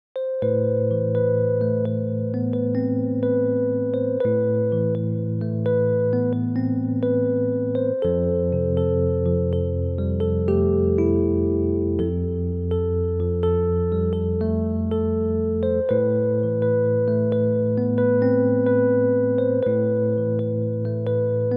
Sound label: epiano; Muzyka Ilustracyjna